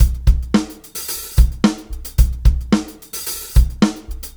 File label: Live Beats, R&B Drums